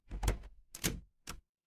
door open

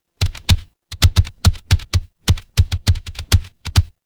office keyboard

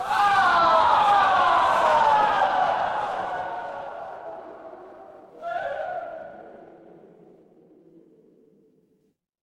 crowd cheer

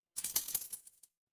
glass shatter

